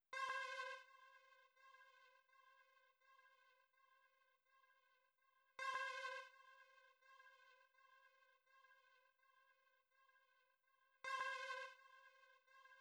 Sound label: stab